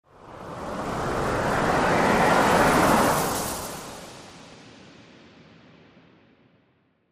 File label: Wind Organic Sweep